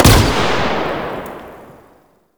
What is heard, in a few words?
Rifle Shot B; Warframe; Zariman Grn Wraith Rifle